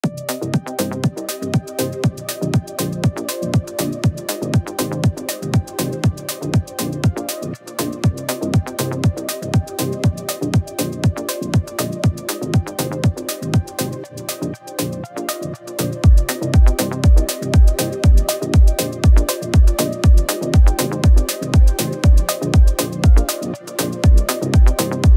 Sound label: progressive house remix